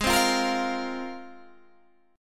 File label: Ab 6th Add 9th down Guitar Open G